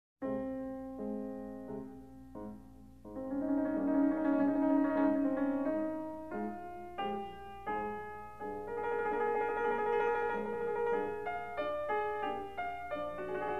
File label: Piano Sonata; Piano Sonatas